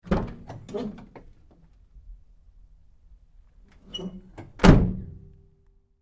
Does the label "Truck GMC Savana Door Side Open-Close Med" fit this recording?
Yes